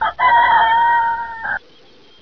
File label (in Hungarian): Kukorékolás; MIDI csengohangok